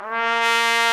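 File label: brass, Tpt Solo; Bach; Roland L-CD702; Tpt 1-4 Solo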